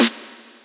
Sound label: drum hit finish